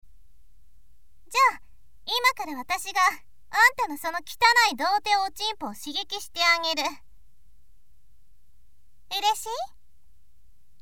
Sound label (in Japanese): 耳舐め 手コキ (SE効果音)